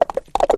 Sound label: bad guy moving; Racetrack Riches; megaboard